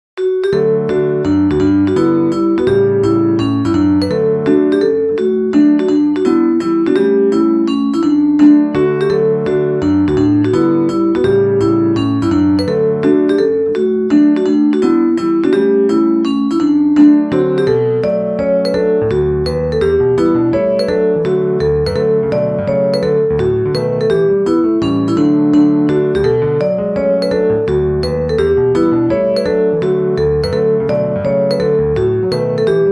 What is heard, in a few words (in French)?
Mazurka